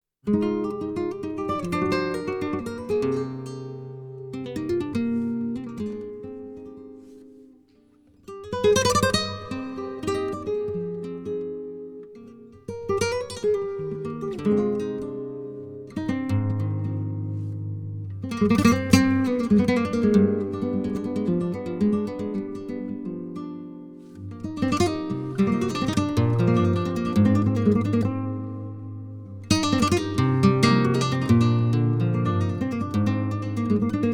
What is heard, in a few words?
Gitara